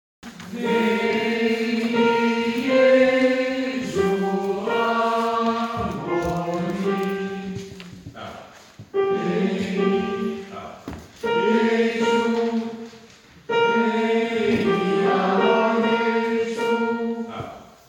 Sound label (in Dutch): Veni Jesu tenoren